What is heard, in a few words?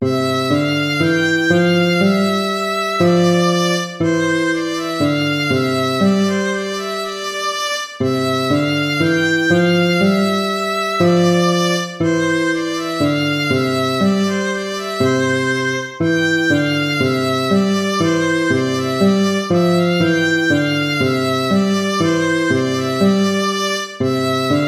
gavotta; hook james